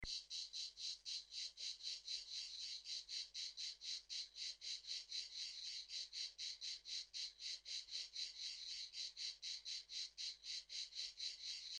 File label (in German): Grillen